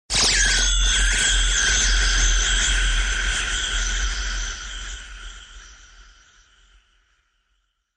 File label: Fancy Sms